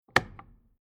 Industrial Red Emergency Stop Power Off Button Pressed Down Electrical; Switch